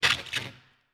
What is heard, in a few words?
Wooden Plank Scrape; 99Sounds; The Warehouse